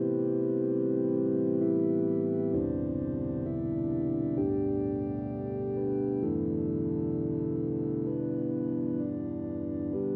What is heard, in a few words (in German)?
Audiokit Nerdsynth Heimorgel Samples